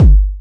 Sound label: Syn Dr, Overloaded Synthetic Drums; style kits